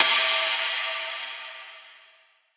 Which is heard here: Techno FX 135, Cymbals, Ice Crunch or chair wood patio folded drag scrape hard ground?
Techno FX 135